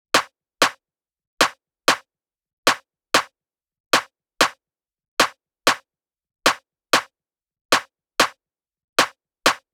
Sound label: Reggaeton Kit 95bpm Snares; Drum Kits; Stems; Ghosthack - Ultimate EDM Drums; Reggaeton Kit 07